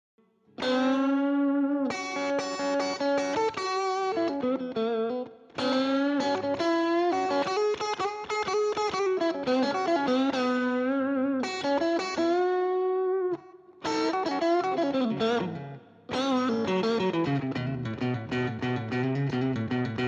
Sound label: axefx tone